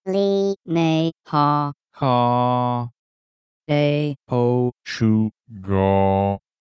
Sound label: Demo melody